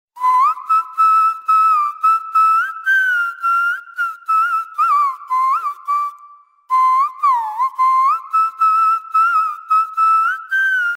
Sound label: classical ringtone